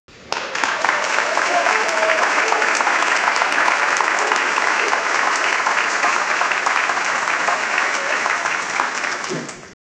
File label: a sound effect of an, ceremony